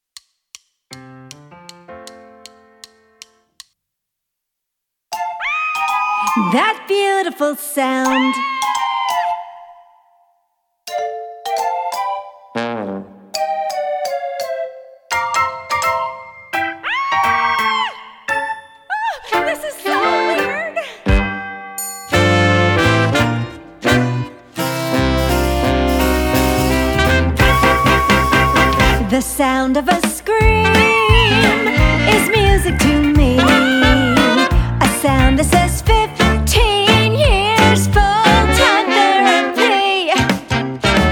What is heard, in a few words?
backing vocals